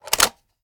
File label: reload magout, weapons; xmag; gmod; viper